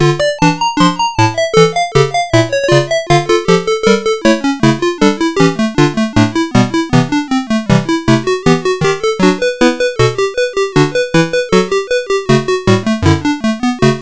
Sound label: BUGS Level Remix, sid CSG8580R5; Chips Challenge